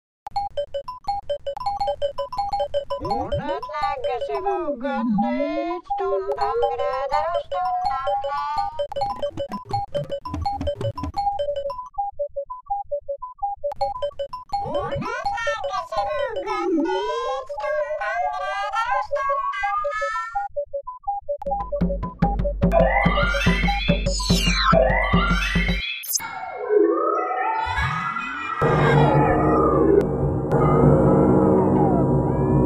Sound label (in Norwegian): musikk mix; skranevatnet